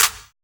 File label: METAL CLAP, TECHNO; Drums In Your FACE!